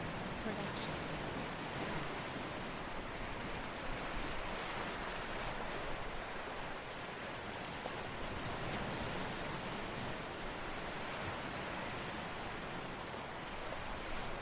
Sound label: loopable waves on rocks; soundscrate